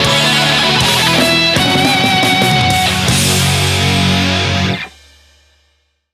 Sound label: Bgm Reach